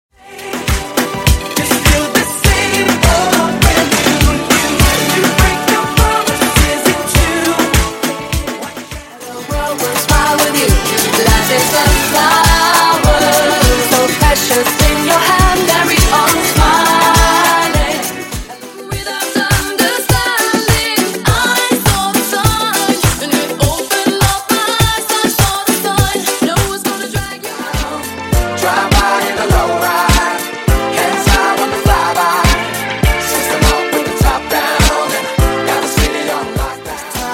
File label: Pop Music Old School; 10 Edits Pack